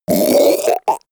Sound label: goblin wet burp choke; koerpergeraeusche; comedy-cartoon; soundpool